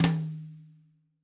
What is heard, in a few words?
Tom High, Drumkits